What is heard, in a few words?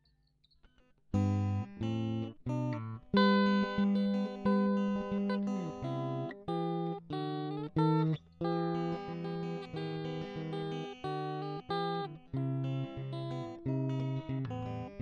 Chorus Mono